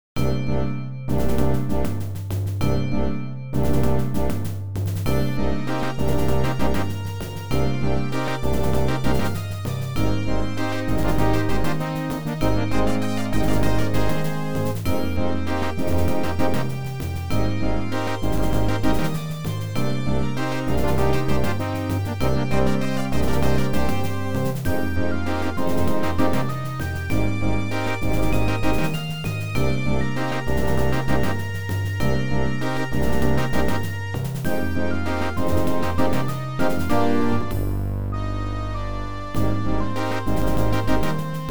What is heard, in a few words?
Midi Positive; Calcu-Late